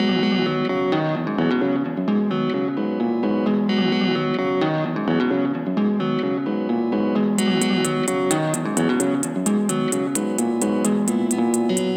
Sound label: guitar sample